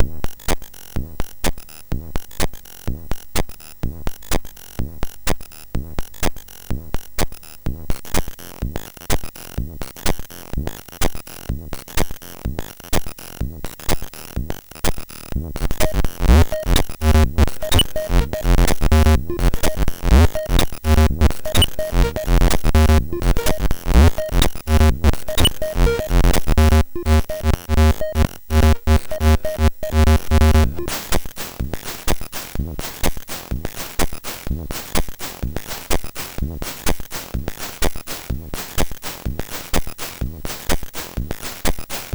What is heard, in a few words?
Electric SID, MOS6581R2